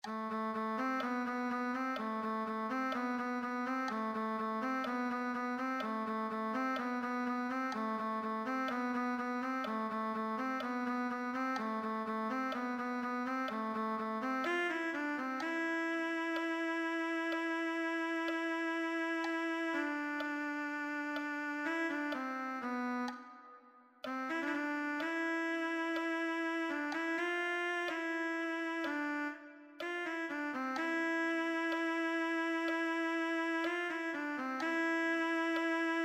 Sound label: cello